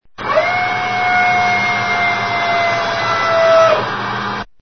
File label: Tanque TANK